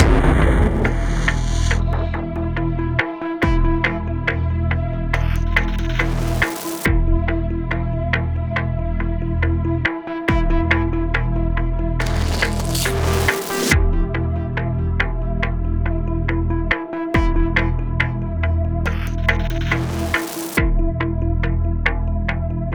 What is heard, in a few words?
Church Stealth; Evacuation